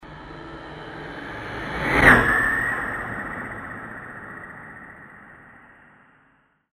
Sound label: Spaceship dopler; Sound Graph